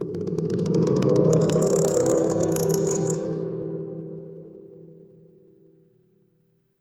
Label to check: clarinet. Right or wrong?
Wrong